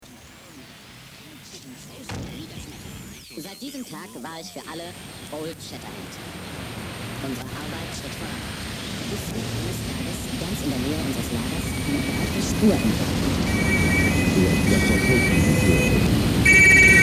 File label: The Noise